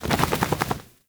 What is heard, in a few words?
Kubrow Foley Idle Shake; Enemies; Warframe